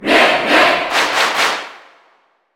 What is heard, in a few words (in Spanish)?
Grito del público (Ingles); Min Min; SSBU